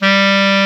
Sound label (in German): Klarinett; Alpine Volksmusik